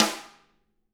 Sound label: DW RIM, SNR; ILIO; Double Platinum Drums 1